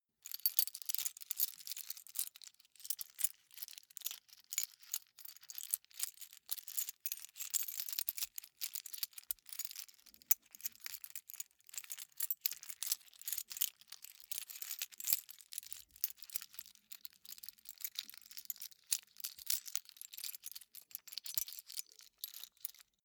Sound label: key ring large rattle sequence soft; objekte; schluessel